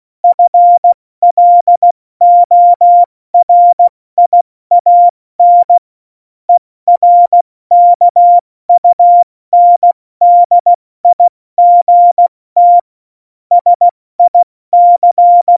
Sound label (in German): Witz, Morsekurs, 70 F80; Witze